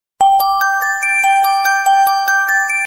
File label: Sweet Bell